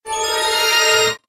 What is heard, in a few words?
chime; raising heart; nanoha